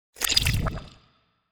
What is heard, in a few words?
warping slide